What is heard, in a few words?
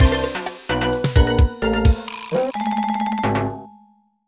Lose; mario vs. donkey kong mini land mayhem; Cannon Cove